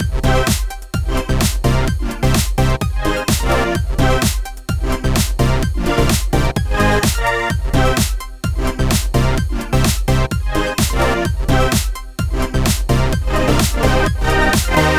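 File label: background music skill; dj cookie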